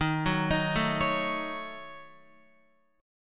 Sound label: Eb Minor 7th Flat 5th Eb down Guitar; Ebm7b5; arpeggiated; JGuitar